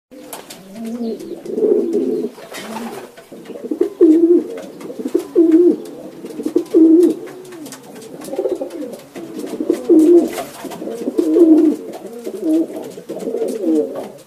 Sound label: pigeons ringtone